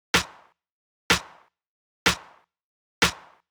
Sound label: Strange Base